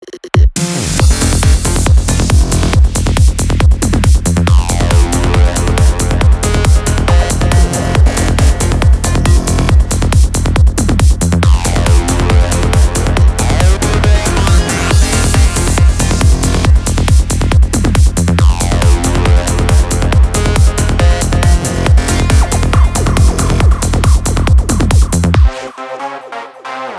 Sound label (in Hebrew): מנגינה